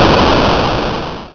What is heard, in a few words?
explode